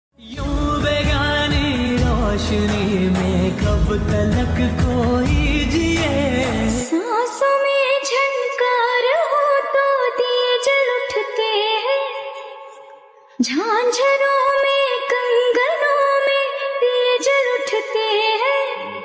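song aesthetic music slowed and reverb sad lyrics song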